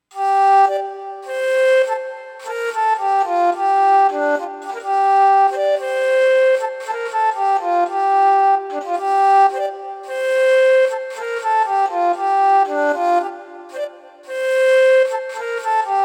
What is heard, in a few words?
Asian Traditional Music, Korean Music